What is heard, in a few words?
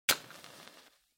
Match Strike